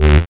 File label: SYN DANCE; Sound & Vision - Gigapack II